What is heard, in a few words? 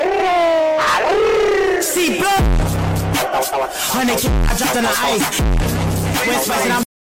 bass boosted